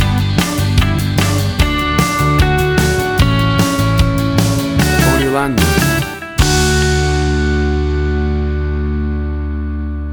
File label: 1990s Ballad Pop Rock